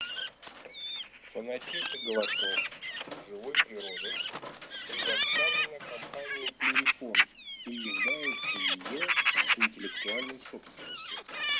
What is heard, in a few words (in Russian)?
Сорока Gazza pica pica; plurifon